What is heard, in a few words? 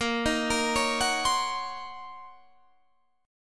D sharp Minor 13th A sharp, chord